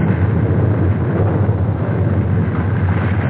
lava; DUKE3D

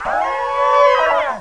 howl; duke nukem total meltdown

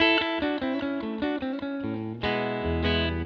Rock and Roll Intro, Rock Guitars; ACID Music; Tele Dreams

